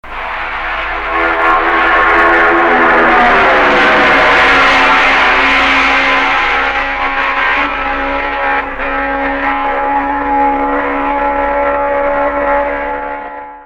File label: Gillera Outfit